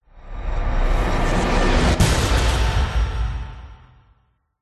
sound design cinematic riser into hit dramatic hard hitting tense; zapsplat